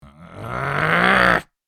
Angry grunt little fantasy creature; fantasy friends

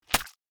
Worm falling impact on surface; slug and worm

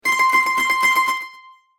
Dulcimer high pitch tremolo short

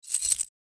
Keys handle and rattle; locks keys

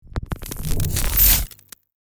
Energy flow fast particles movement

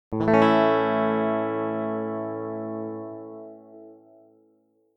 Electric guitar A major single soft strum

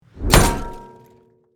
Shield impact clang hit

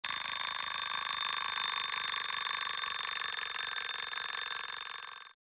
Vintage old alarm clock ringing

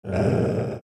Goblin emotion bad aggressive

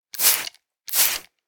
Barber shop spray bottle spraying

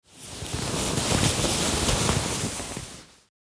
Sleeping bag dragging noise

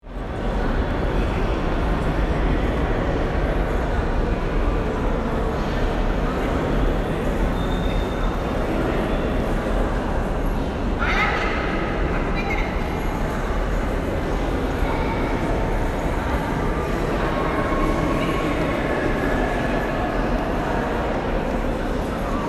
Science museum ambience hallway distant people

